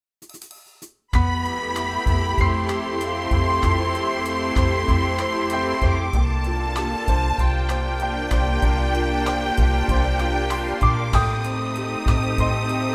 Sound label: F orch